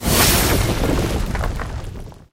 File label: labyrinth open stage